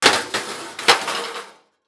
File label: Impact Metal Drop On Concrete Multiple Objects